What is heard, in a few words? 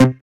Synths Root C